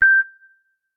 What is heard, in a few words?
Samsung ringtone Beep Once